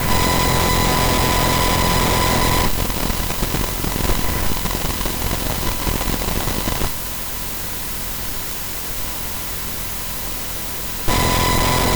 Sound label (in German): cpu rauschen verschiedene taktungen